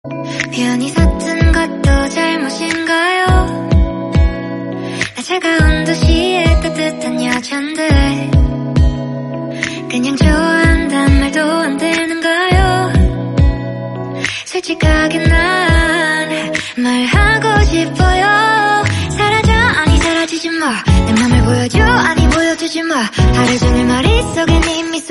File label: Korean Song Ringtone